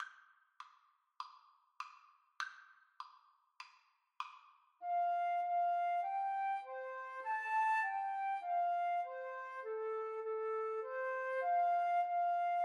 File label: ode to joy, Flute Duet